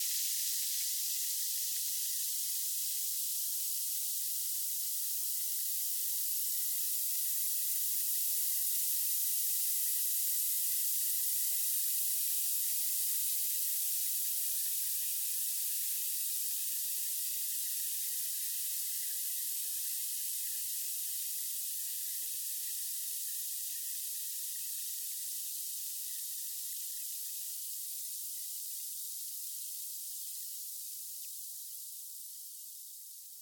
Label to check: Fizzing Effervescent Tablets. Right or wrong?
Right